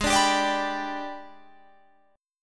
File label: Gsharp Minor Major 7th Flat 5th, Guitar, Drop D; 18,17,17,19,20,19